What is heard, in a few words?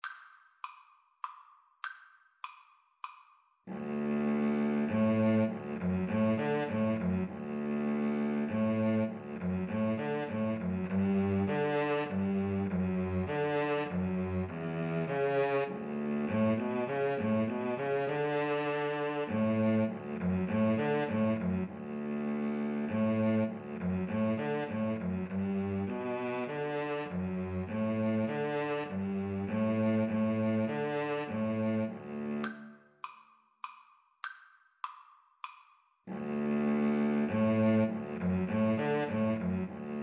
Cello Duet